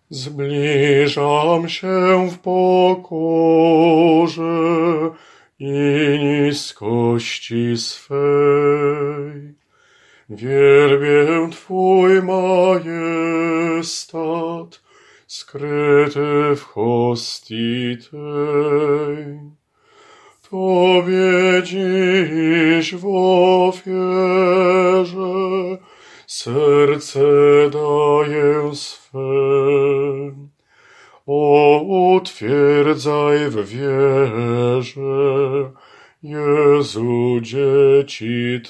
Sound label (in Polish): Zblizam sie ALT